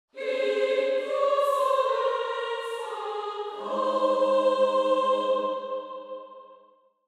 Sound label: Angelic choir; angels heed the celestial call